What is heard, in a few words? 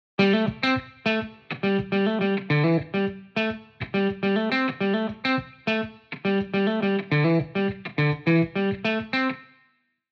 guitar pattern 2 gt